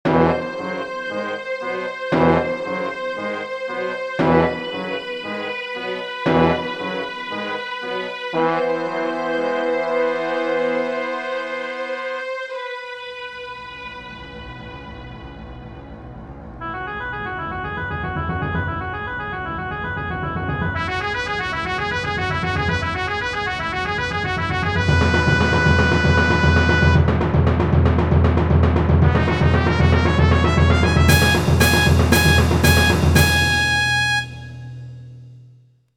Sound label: Fanfare